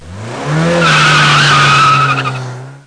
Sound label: peel up